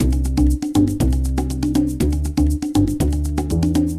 Music Result Screen; rakoo friends wii u 2015

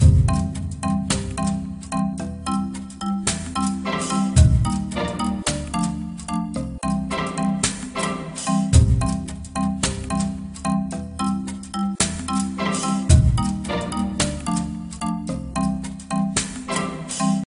Jungle Level

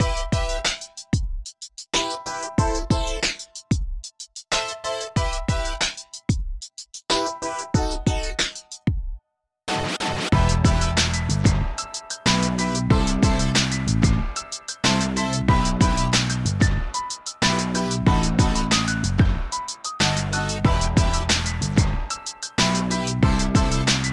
Radio Hiphop; Gangstar West Coast Hustle; Android

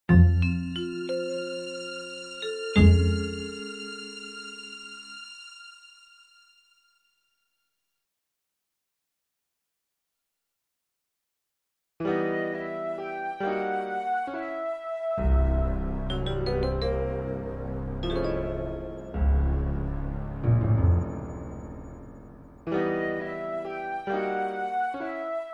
Orchestra - Ambient; The Operative No One Lives Forever

